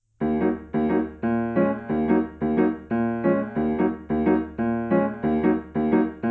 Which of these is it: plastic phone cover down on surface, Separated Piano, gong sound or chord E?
Separated Piano